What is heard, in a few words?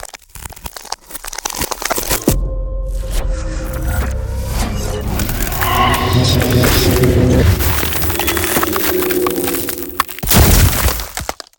Ice Spell Ultimate Arctic Wrath; Ultimate Ice Spells; Arctic Aura